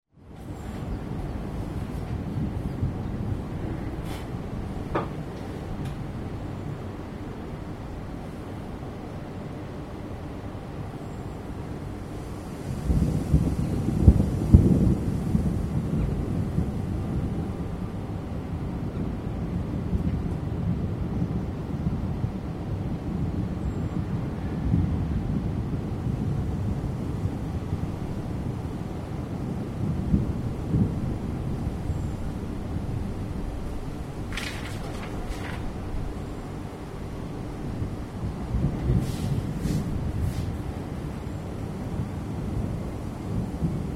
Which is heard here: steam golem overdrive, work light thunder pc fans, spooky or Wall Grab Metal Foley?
work light thunder pc fans